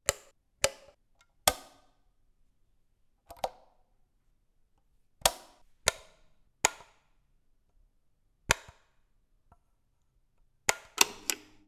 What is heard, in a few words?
Light Switch, Hotel, Vietnam